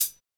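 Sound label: hi hat; HH-Kits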